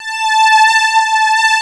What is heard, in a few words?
STRGS; USB Soundscan vol.49; Analog Fantasies; AKAI